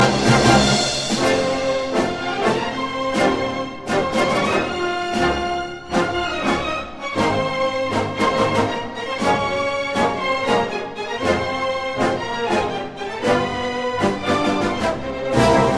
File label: Orchestral Ringtone; Zelda